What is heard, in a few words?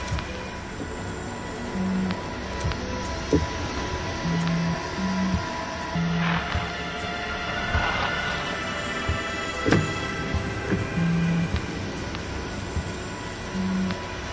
static musical atmosphere loop; LOOPING AMBIENCE